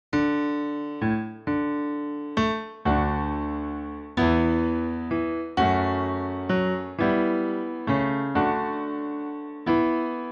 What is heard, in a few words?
Whistle Piano